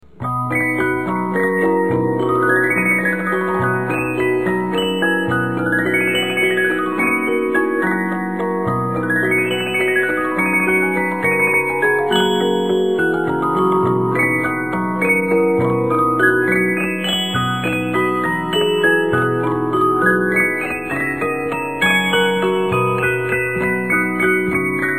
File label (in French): reuge guido, boites musique